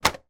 game monopoly game money notes wad put in box tray